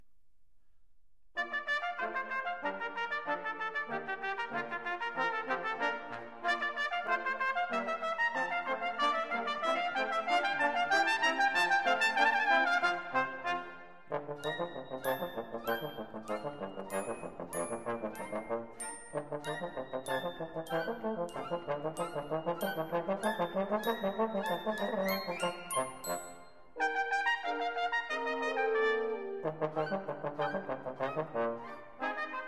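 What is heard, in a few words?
double concerto for bass trombone trumpet and band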